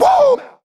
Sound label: "woo"